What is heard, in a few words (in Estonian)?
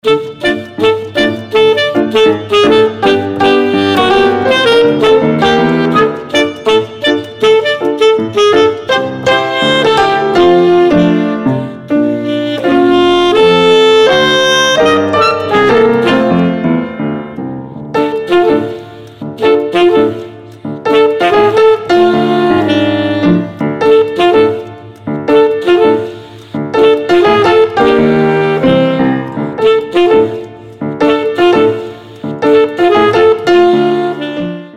klarnet